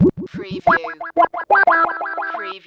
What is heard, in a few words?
Hip Hop Synth Licks 90 BPM - E - Straight